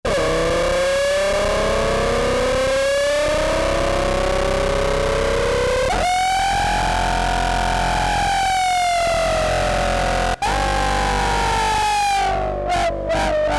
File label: Aaaaahhhh, Novation XioSynth; Novation XioSynth 25